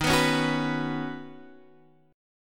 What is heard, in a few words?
D sharp Minor 7th Sharp 5th D sharp, down, Guitar, Half Step Down; D#m7#5